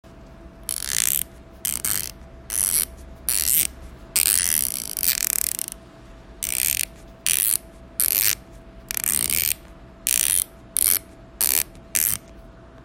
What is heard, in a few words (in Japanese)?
ラチェット音